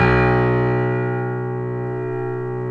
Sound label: WARM PIANO